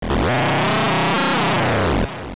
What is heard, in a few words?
Boss Die; A boss killed (final hit); Nightmares